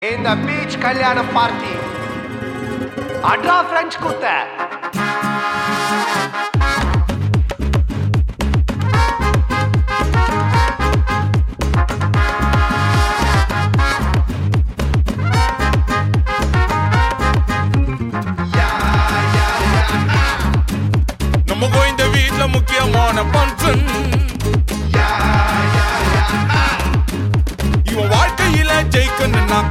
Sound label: Kuthu Ringtone